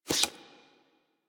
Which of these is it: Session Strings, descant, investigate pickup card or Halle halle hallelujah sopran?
investigate pickup card